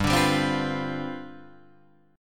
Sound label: G 11th G, chord